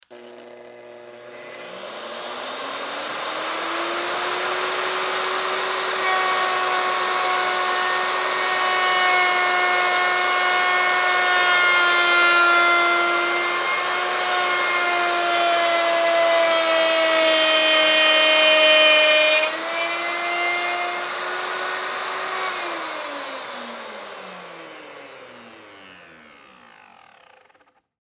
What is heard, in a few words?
Plunge Router 2.0 Hp Turn On Cut Wood Turn Off; Machinery; Industry